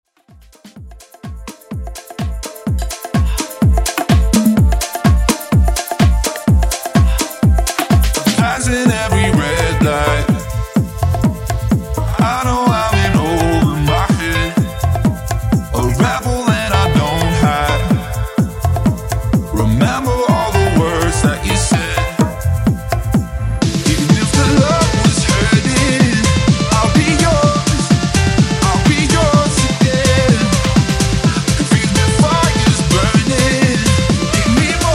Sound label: Tech House Mashup; Top40